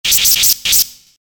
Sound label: laser zaps